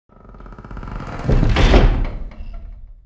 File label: dungeon